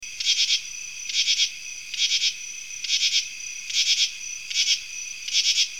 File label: Pterophylla camellifolia; Arthropoda